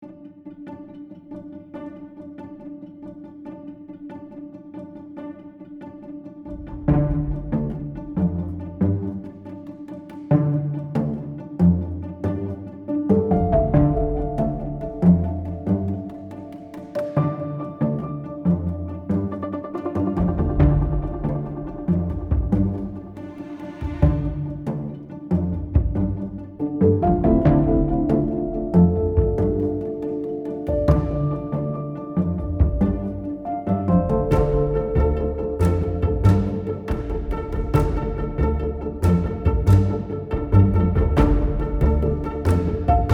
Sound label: serious movement